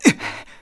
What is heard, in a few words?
Hurt; Link getting hurt (mildly)